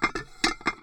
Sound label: dishes In; netent